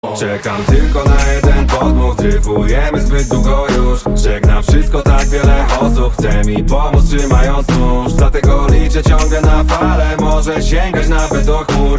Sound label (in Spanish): flauta